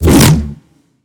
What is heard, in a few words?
swing small; smite; god arthur